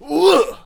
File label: Ninja Attack Vocals